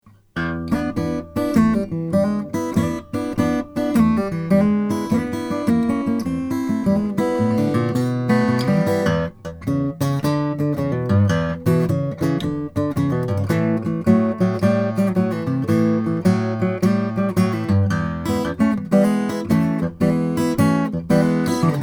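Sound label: bourgeois soloist omc aged top brazilian rosewood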